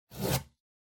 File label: Cardboard Box Label Peel Off; ダンボール; ラベル 剥がす; 梱包材